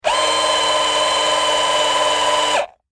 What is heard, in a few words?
Industry Electric Drill Tighten Screw Whine Stress Long; Drills